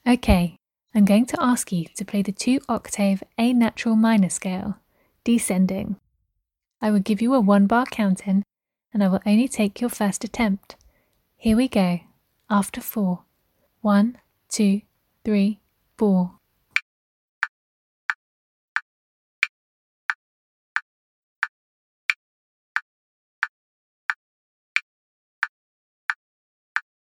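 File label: 2 octave natural minor descending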